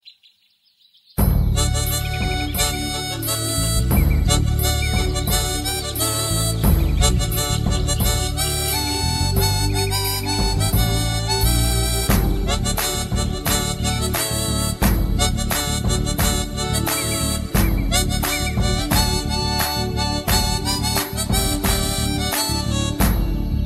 village tune

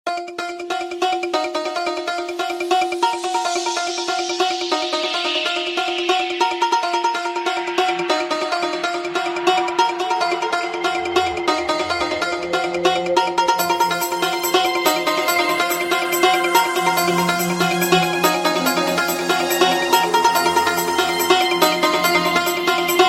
trance

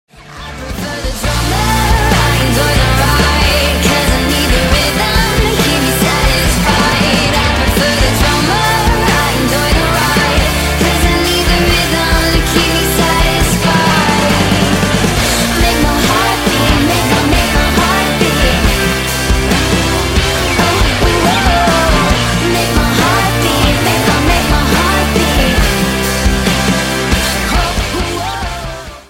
drummer

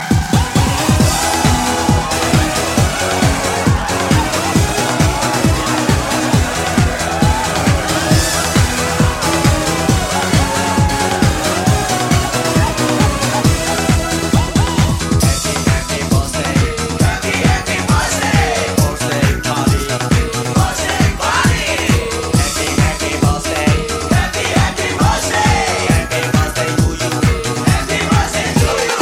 happy birthday techno remix

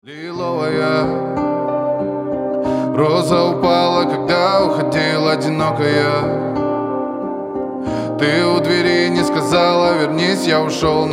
acoustic

